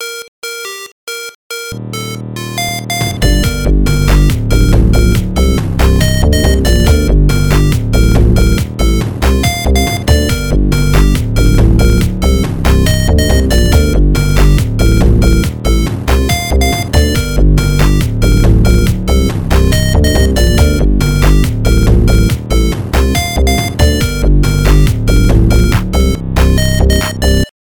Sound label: RINGTONE